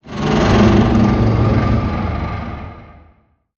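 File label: Creature Sawtooth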